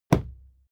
fight impact sweetener punch low; krieg-waffen; nahkampf; soundpool

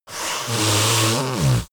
yeti nose blow long

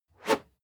whoosh whistle fast

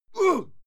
male fight yell short

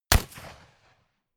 rifle ak-47 exterior fire single clean; comedy cartoon; pistolen gewehre; Pistols and guns

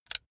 razor extend comb single; maschinen; rasierer

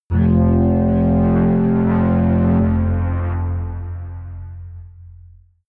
synth atmospheric bass fluctuate, drones

